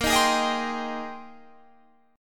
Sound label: Bb 13th Suspended 4th; Bb13sus; strummed